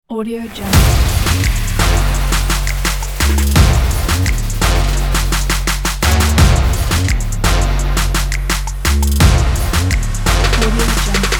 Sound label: stomp trap